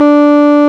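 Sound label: RMI piano D 3, EP; Sonic Reality - Vintage Timetraveler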